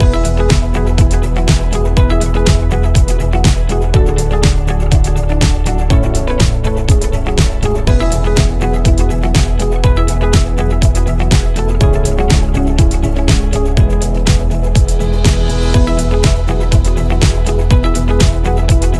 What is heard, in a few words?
Upbeat Loop; corporate interview